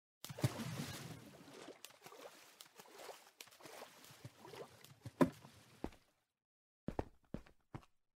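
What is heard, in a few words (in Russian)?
shagi po vode, footsteps in water; minecraft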